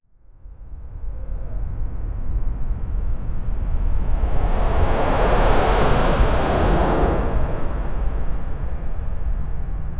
a non powere, aircraft

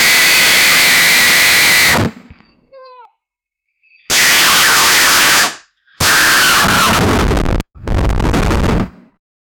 loud long thick violent, gross

